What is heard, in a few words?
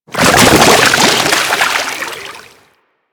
Shipyards Sludge Burst; Ambience; Props; Warframe; Grineer Shipyards